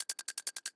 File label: propeller slow